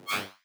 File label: organic sfx